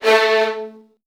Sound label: A1, VN 16TH, Virtuoso Strings; ILIO; Kirk Hunter